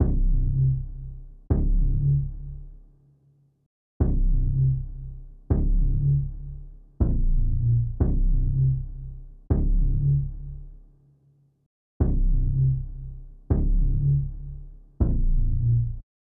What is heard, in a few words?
120 BPM F Min Bass; Loop Stems; Cymatics; 2022 Melody Collection Platinum Expansion - Pt 1; Frozen